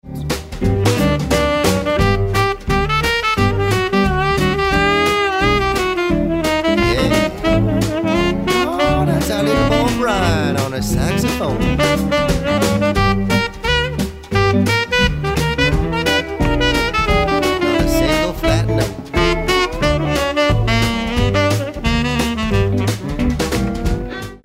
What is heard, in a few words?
sax